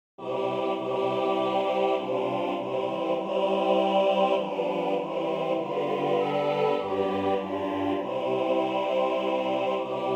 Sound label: tenor; It Is Well